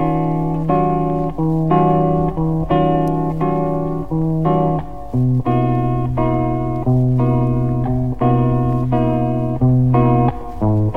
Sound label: guitar rhythm, Loops; Phonky